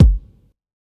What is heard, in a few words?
kick, electronic